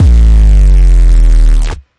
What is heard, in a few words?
gnarly distorted bass hit; Basses